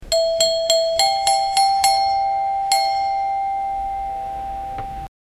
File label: store doorbell ring cheer